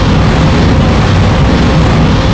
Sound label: Booster Loop Ext